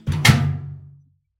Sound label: barrel Close